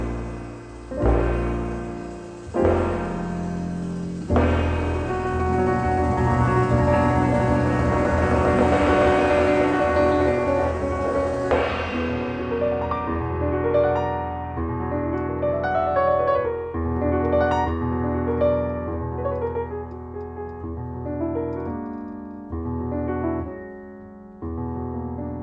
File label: jazz